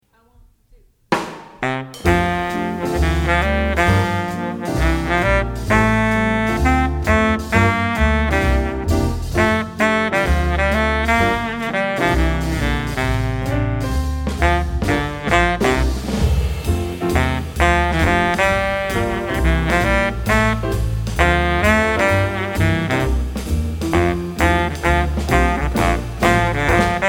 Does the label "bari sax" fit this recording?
Yes